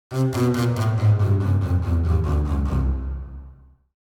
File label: Cartoon disappointment failure